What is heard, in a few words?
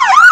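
emergency vehicle yelp, sirens; gmod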